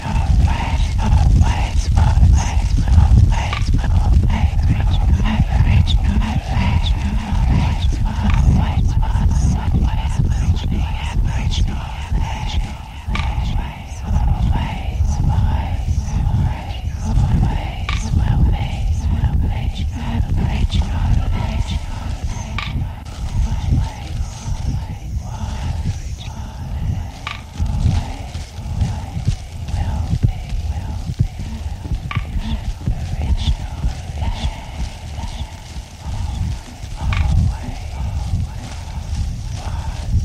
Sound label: Soundscape Transition land